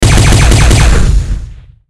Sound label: gun